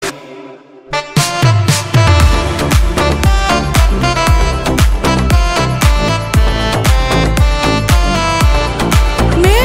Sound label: BGM